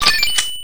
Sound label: crystal small